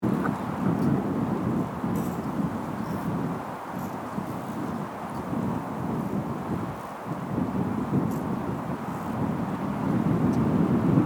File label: paranal exterior night wind, stereo; soundscape